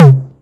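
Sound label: TR606 Tom Low overdrive, Roland TR-606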